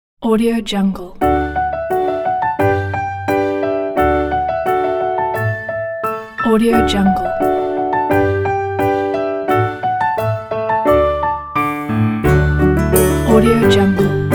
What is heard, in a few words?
happy kids